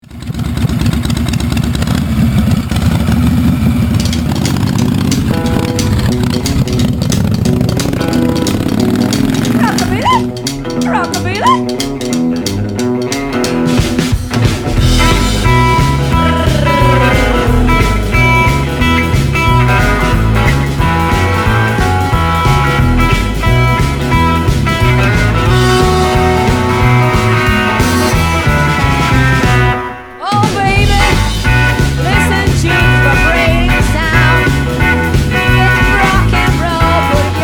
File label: rockabilly rock n roll